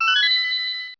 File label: Pick Up Key; Objects; Open Zelda; Quest Designer 2.0.4b